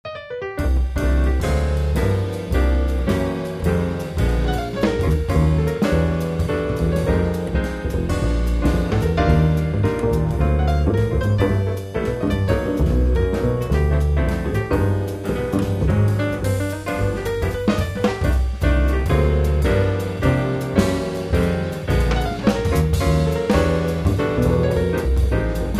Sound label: jazz